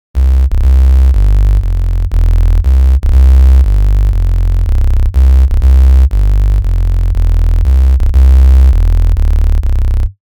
buzz synth 98hz bass

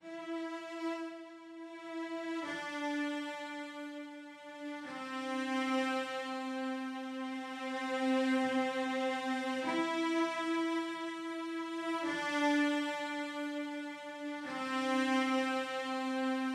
cello strings

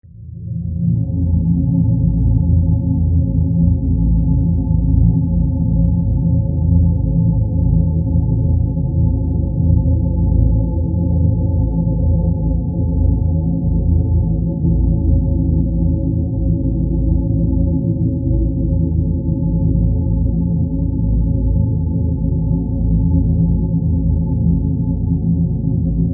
drone; archeology; alienation

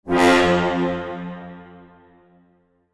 pure space orchestral cadence

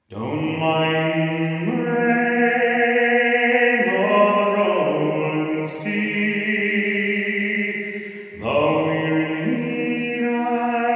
pure space

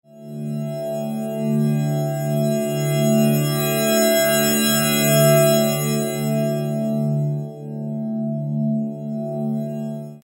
drone tampura; drone archeology

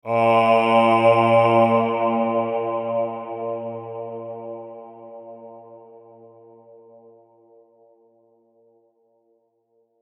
pure space vocal

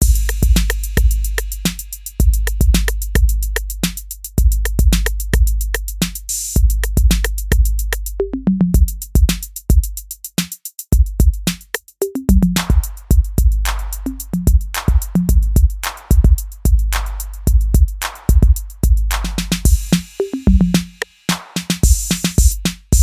tape 808 bass thichener

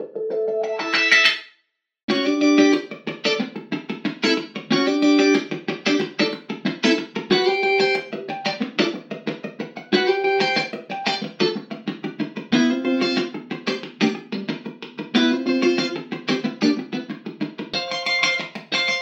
electric guitar flat

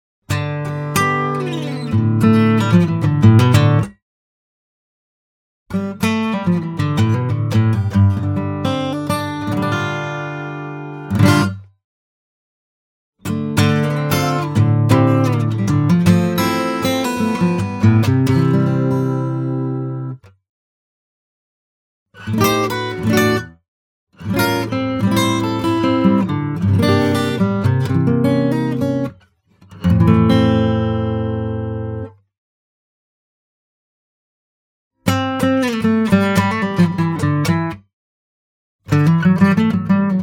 gtr dry

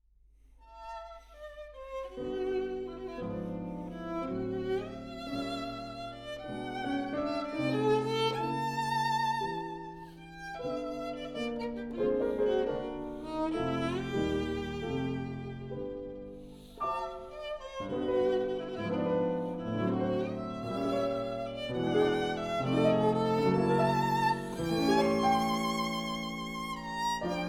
short stories dry

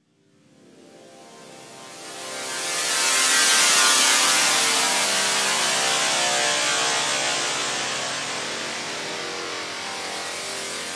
drone heat wave; drone archeology

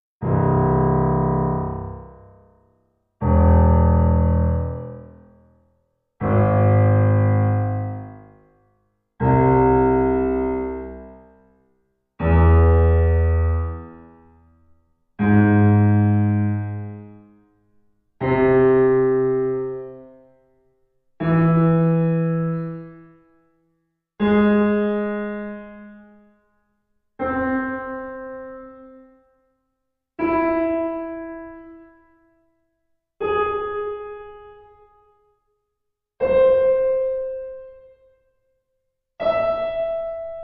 pure space classical hall

